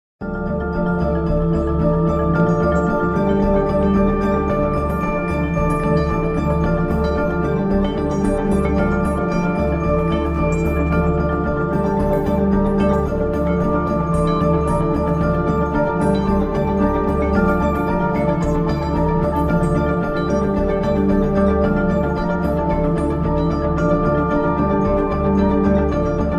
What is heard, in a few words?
Loot Box; Overwatch